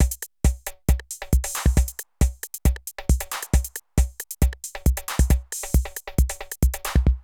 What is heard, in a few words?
Drum loops, Euro techno